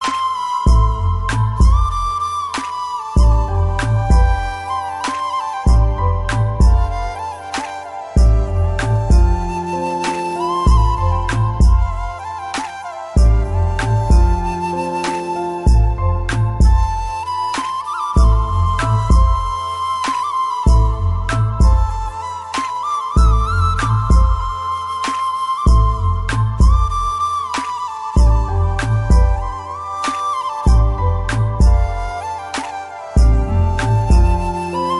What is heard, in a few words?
very cool flute